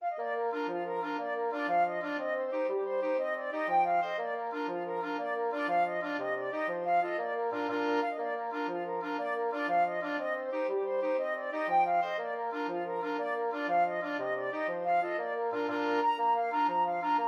irish jig medley, flexible mixed ensemble 4 players